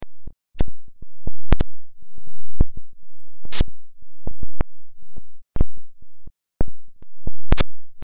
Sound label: fm7 patches